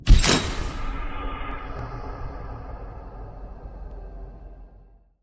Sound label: generic death sound